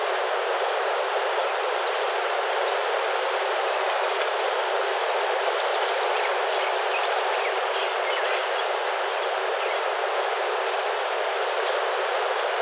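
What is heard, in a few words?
boathouse bird ambience; ambient; nature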